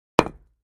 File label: Patio Block Hit Sledg, concrete & brick